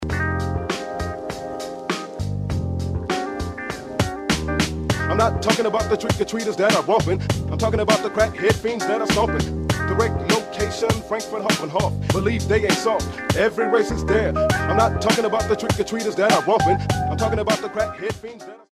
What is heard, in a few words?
hithop; Huawei; C5320